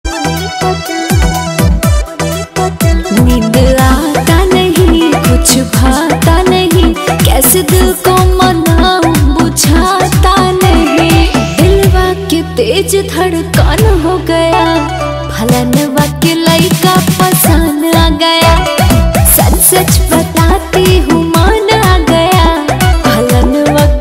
bhojpuri ringtone

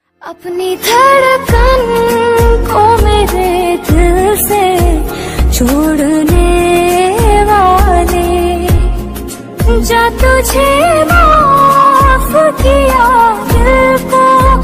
female version ringtone

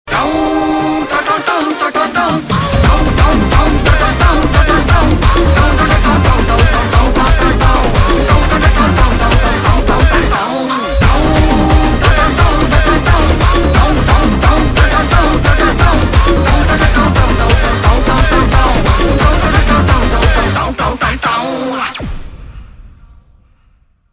BGM ringtone

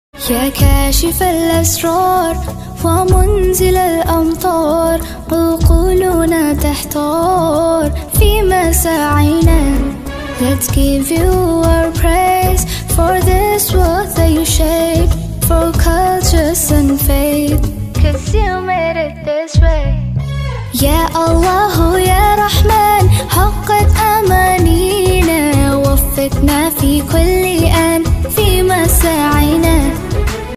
arabic ringtone

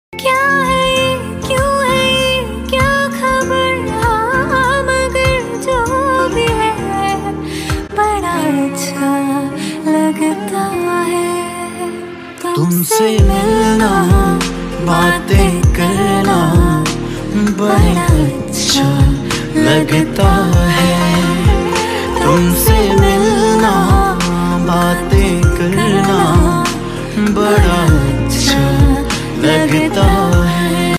female version hindi ringtone

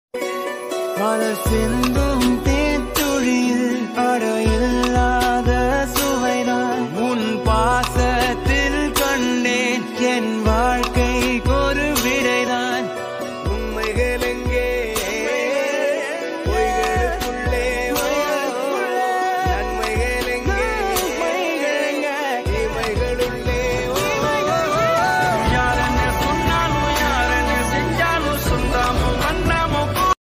Tamil friendship ringtone

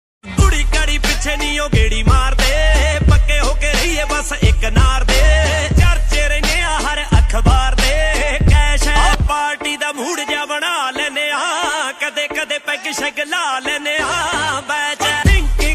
punjabi ringtone

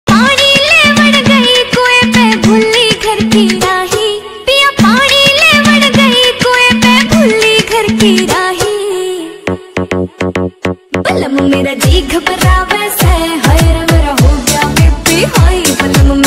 haryanvi ringtone

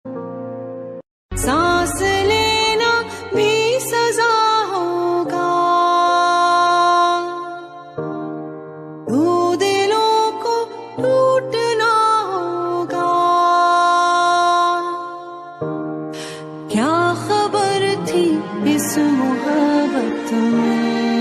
Ost pakistani ringtone

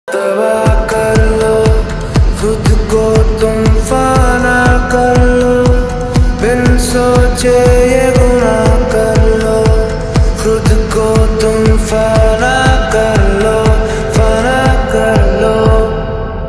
hindi ringtone

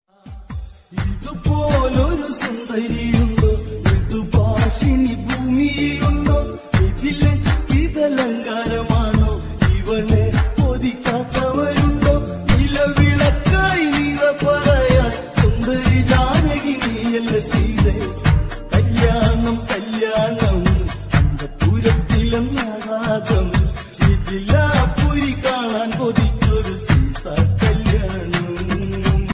malayalam ringtone, malayalam devotional ringtone

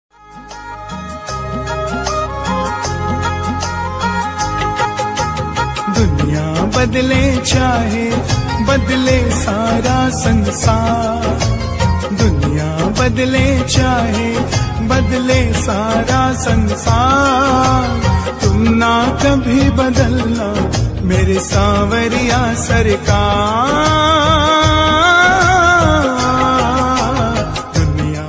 krishna bhajan ringtone; devotional

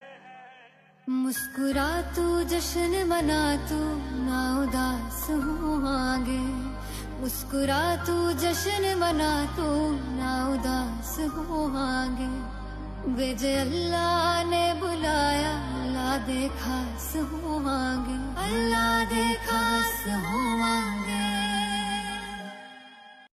female, punjabi ringtone

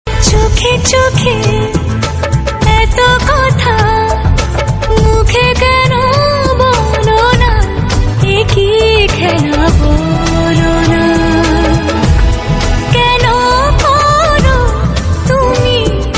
bengali ringtone

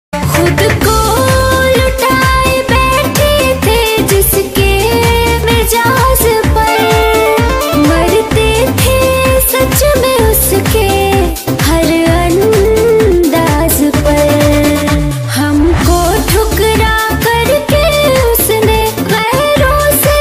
bhojpuri ringtone

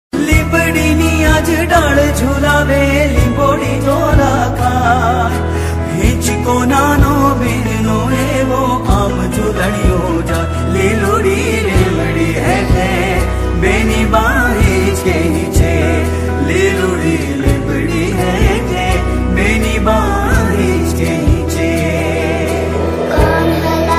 gujarati ringtone

